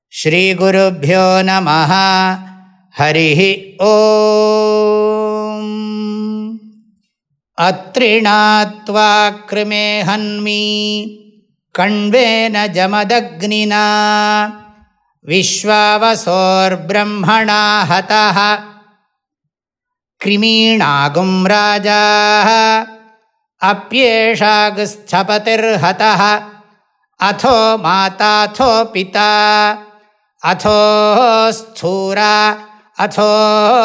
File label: Chant; for eradicating germs